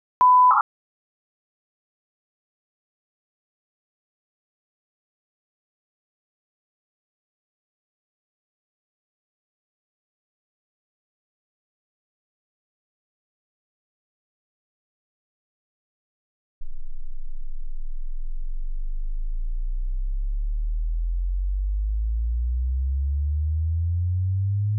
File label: sweep for reverb 48k